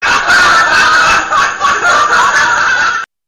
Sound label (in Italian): Risata